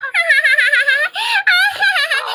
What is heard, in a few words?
cute laugh